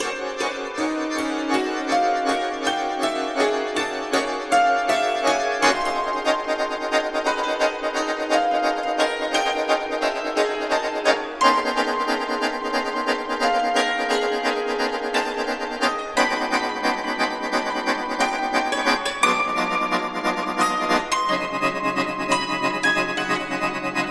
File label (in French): xylo; chambre